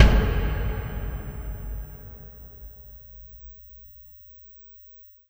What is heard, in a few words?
Kick Shard, Processed Drumkits; Sonic Foundry; Zero Gravity Beats; Shards